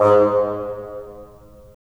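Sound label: WND BN, wind bassoon; LA Composer vol. 3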